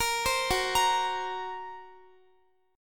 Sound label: F sharp Major Flat 5th F sharp Guitar; F#Mb5 arpeggiated; x,x,20,17,18,17